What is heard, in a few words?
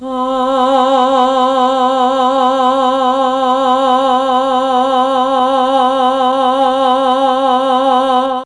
C1 FEMALE, Mellotron; CHMB FEMALE; Mike Pinder - Mellotron Archive CD